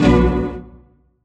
Stab Orchestra Hit Vinyl; One Shots; Ableton Live 12 Lite; Core Library

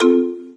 save button; uisounds; There; ThereClient

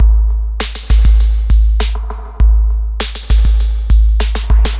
ELECTRO SET; Sony; ACID Pro 6.0; Startup Project

